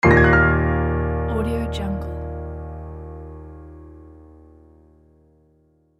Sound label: tragic